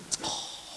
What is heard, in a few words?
Tsk; Zoemtonen hisses pingen woesjes tikjes